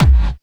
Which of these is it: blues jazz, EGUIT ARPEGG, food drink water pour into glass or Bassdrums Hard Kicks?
Bassdrums Hard Kicks